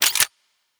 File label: Weapon Reload